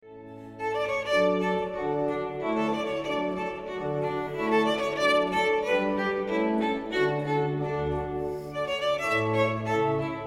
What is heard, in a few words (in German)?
son org vl orgel; Violine Orgel; telemann